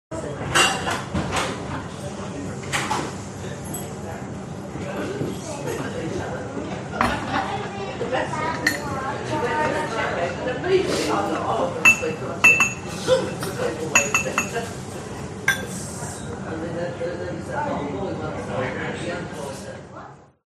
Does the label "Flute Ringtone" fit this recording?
No